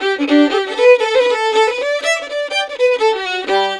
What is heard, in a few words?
violin, Celtic Flavours; USB Soundscan vol.42; AKAI